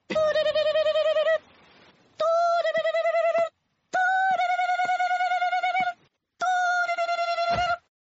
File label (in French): Doppio sonnerie